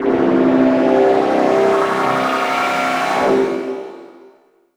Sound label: SFX -L, Drum & Bass; Ueberschall; Drum & Bass Resonance